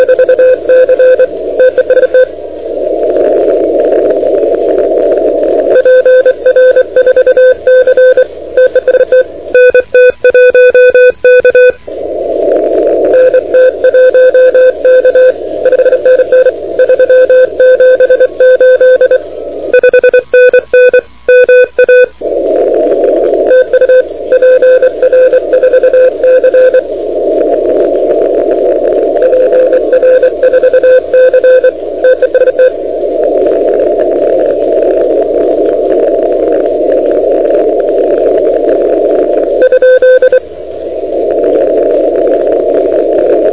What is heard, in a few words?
CW 28MHz; ARRL 10M